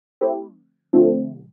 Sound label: house synth 125 A, keys